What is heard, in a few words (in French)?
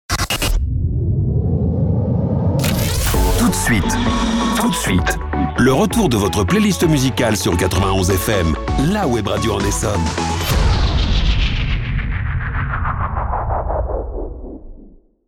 Jingle Raccrochage PUB